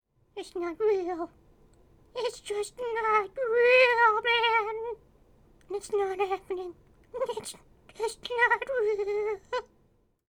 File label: Male Reaction